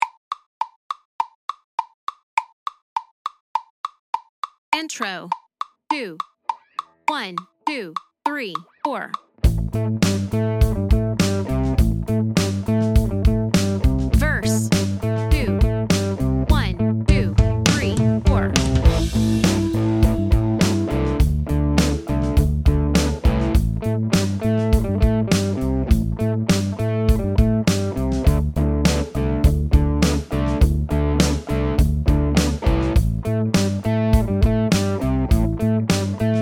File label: backing